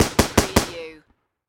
Machine Gun M240B

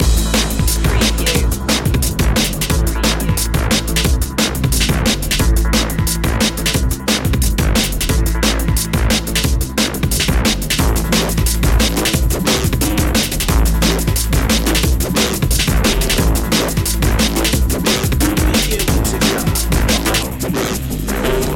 overdrive loop

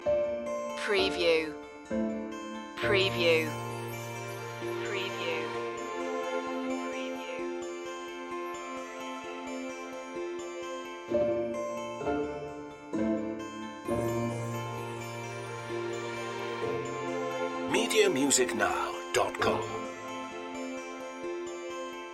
embrace loop

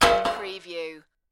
Metal Impact - Drop Metal Pipe On Metal

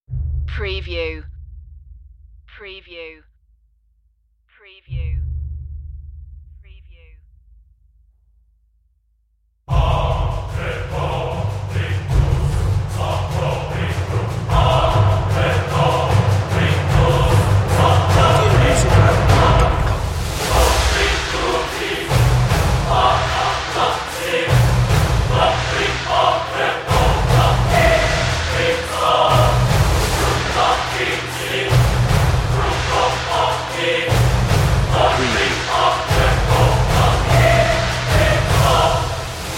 perc and choir only

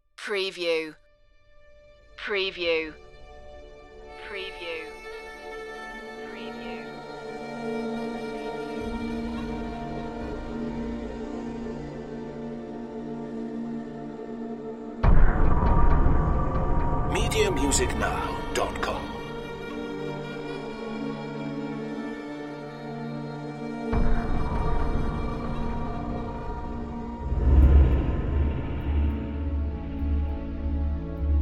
big hollywood dramatic score

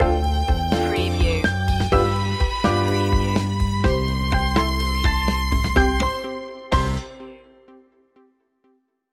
carefree day out stinger and ending

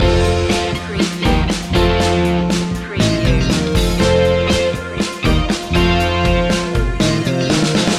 motown sparkling loop

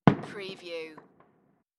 Firework bang; beanstalk audio

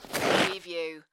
Snow and Ice, Ice scrape small

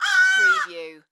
Bird Crow Caw Single; sound-effects-bible

